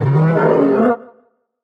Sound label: monster roar long; soundscrate